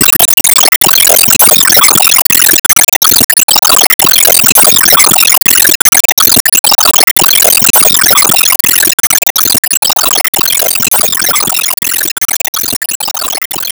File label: Enemy Bigclam Active Loop